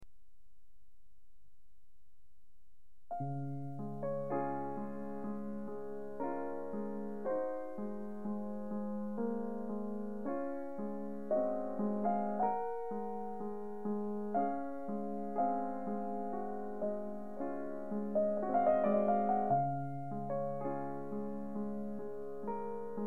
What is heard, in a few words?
Chopin Prelude 15 Raindrop